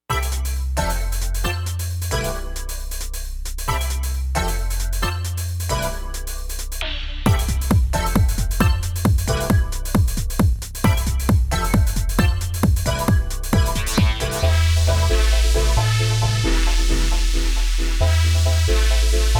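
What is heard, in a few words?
Roland Fantom XR; Roland Fantom XR Synthesizer/Sampler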